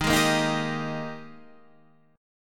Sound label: G sharp Major 7th Suspended 2nd